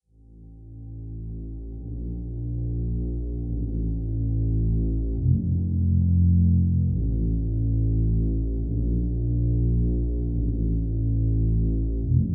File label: game over music; kurovadis